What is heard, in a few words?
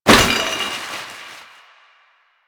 Garbage Bag Destroy; Warframe; Ambience; Nineteen Ninety Nine